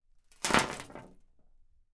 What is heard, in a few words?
Sand Pebbles; TOOLKIT vol.2